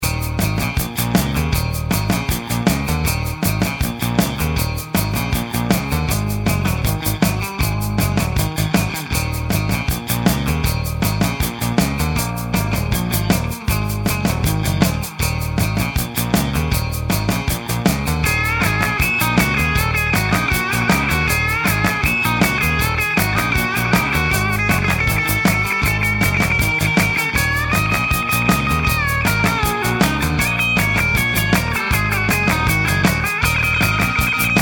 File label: Instrumental; PM USA